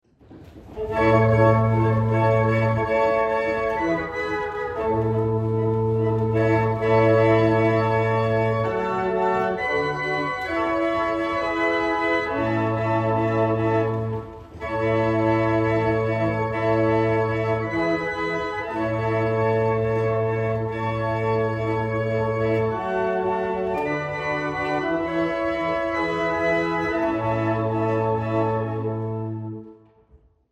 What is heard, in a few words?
Single chant Old Melody, barrel organs; Norton